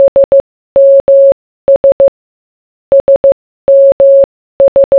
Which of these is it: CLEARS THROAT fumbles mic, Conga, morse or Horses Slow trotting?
morse